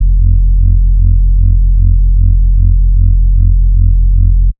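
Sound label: bass sustain F; Polyend; ARC Noise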